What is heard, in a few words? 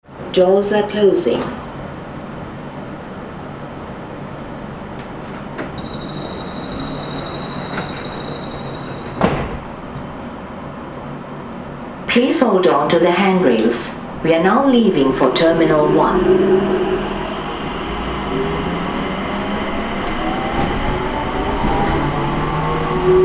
airport shuttle drive computervoice